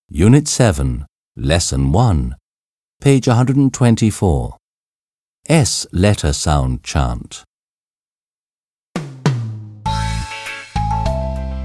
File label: S Letter Sound Chant